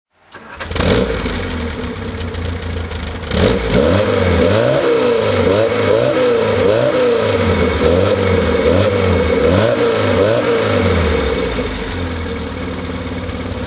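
austin cooper s throttle, Austin Mini Cooper S Mk II, Engine sound; 1968; V3D/ ClassicarImages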